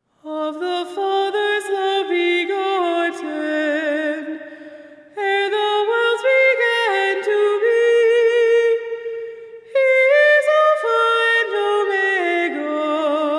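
[F] Of The Fathers Love Begotten [Christmas][hymn][acapella]; so many Sirens singing togeth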